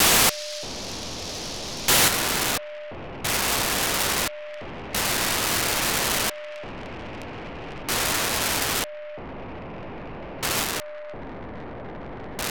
Ledsat 435MHz190 1k2 GMSK AX100 Mode 5 beacon, satellite; On December 28th 2022 at 10:35 UTC Daniel DL7NDR received on 435.190 MHz the 1200bd GMSK b